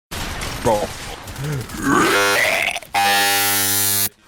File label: rage glitch